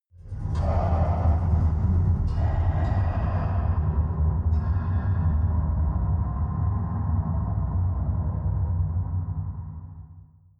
mechanic drone

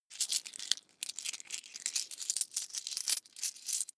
coins clatter soft long

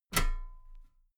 metal ladder step regular

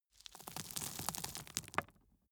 gravel drop

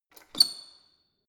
letterbox metal lock

